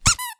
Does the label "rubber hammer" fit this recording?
Yes